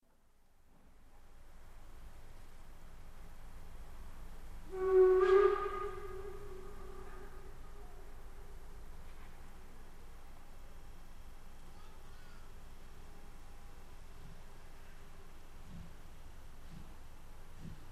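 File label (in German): Baureihe 64 419 Tv mit DPE Ausfahrt, Dampflok; DPE 92135 Gaildorf Backnang; Sulzbach Murr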